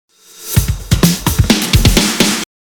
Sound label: DRUM FILL OVERDRIVE